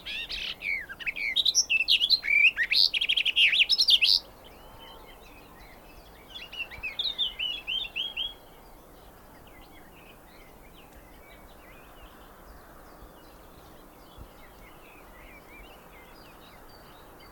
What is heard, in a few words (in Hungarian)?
kerti poszata hangja